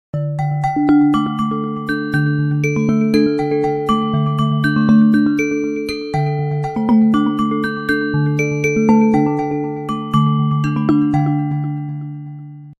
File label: iphone original ringtone